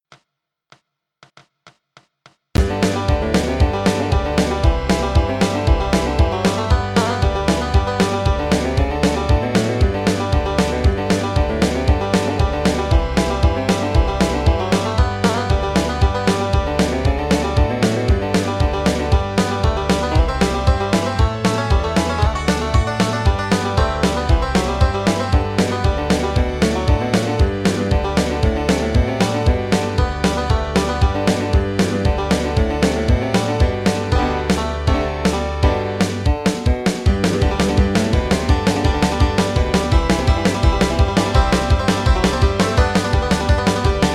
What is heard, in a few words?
Bluegrass Banjo G; I Wish I Were In Dixie